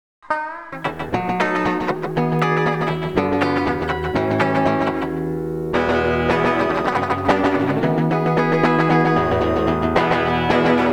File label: Lofi computer blues